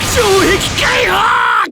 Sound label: burst